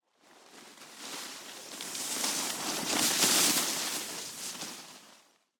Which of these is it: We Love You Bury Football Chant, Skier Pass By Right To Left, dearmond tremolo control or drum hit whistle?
Skier Pass By Right To Left